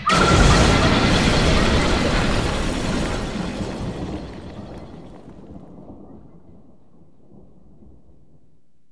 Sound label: SFX; Killer Pearl 5.1